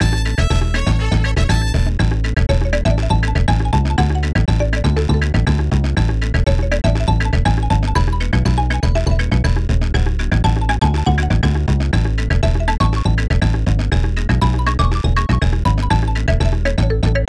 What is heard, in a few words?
snes calypso; donkey kong country 3